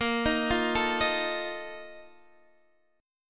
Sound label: A sharp Major 7th Suspended 4th, down; arpeggiated; chordshape